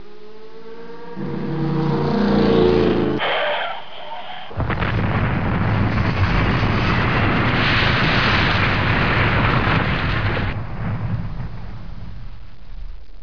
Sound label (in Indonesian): suara mobil